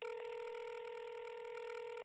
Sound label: ring outbound; blink-cocoa